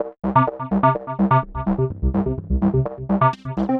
126 C SYNTH ARP; funky; Loopmasters; Funky Underground Vol 2

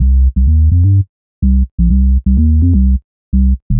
126 C BASS, Funky; Loopmasters; Funky Underground Vol 2; BASS LOOPS

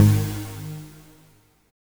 NOISY Eb2, dubstep; Jack Sparrow Deep Dubstep Progressions

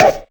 Perc, Dubstep One-Shot Drums

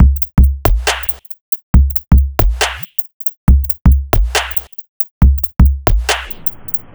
Bpm138 Turntablist Break, dubstep drum loops

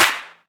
Claps & Snares

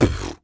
Human Beatbox, Beatbox Hits

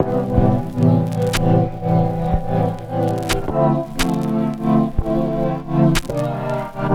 Bpm138 Flow Pad Loop AmDmCm, Dubstep

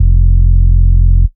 Bass Shot F

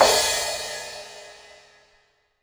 Crash, Dubstep One-Shot Drums

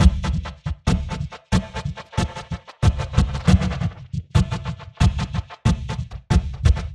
Bpm138 Tub Dub Seq Loop Dm, Dubstep

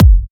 Bassdrum

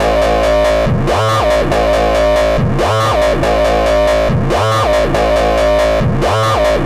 140 A BASSLINE, Dubstep

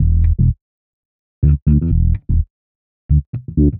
126 E D BASS, Funky; Loopmasters; Funky Underground Vol 2; BASS LOOPS

